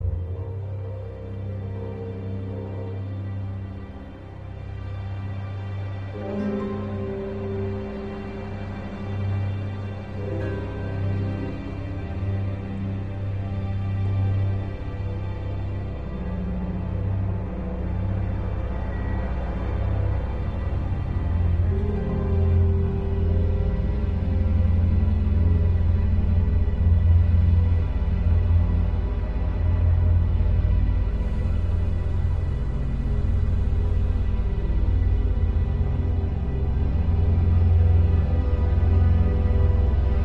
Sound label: Eerie loop